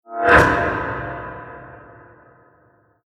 Reverse Light Metal Stinger Paint Pot Impact Reverb Whoosh; Sound Design; Stinger Sound Effects